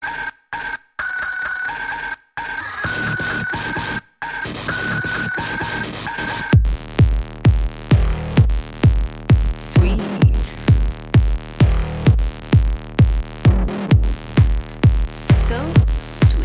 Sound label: Sweet Bass